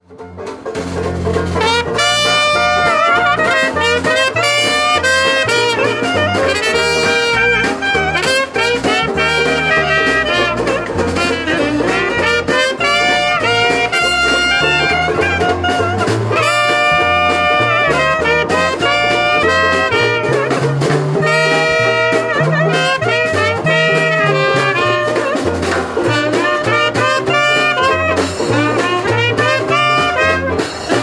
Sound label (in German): radnacht jazzorchester; 5 meerbuscher radnacht